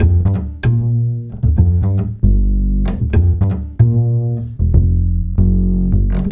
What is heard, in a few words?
Acoustic Bass